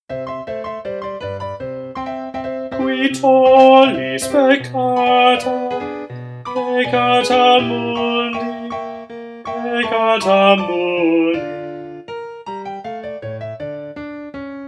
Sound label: Bass